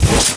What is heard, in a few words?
attack; ROCKSTRIKER